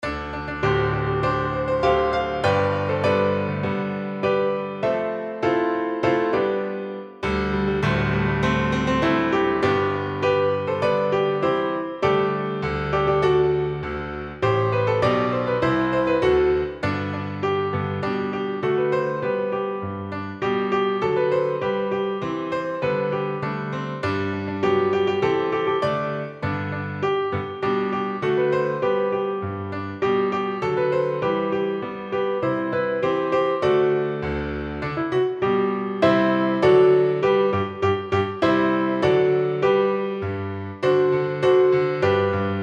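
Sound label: A FESTIVE GLORIA accompaniment; treble; partbypart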